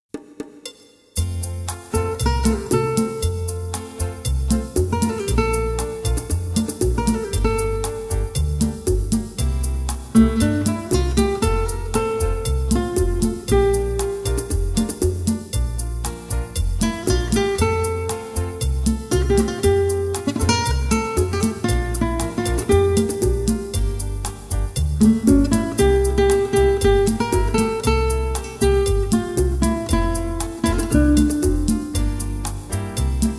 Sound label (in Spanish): guitarra